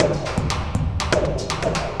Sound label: METAL LOCK; Spectrasonic Distorted Reality 2